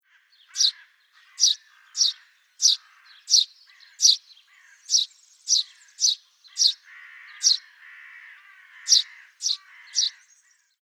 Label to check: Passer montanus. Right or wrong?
Right